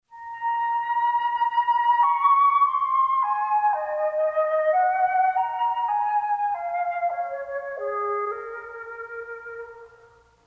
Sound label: flute